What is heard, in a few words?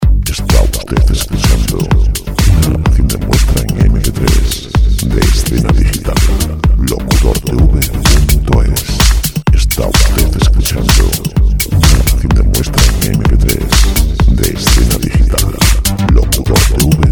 dance